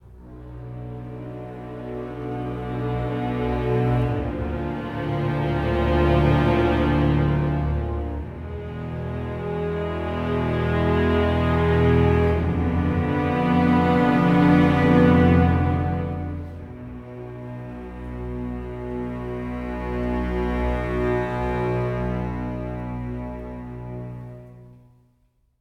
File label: Ambient Cut; crysis 2; ps3; xbox 360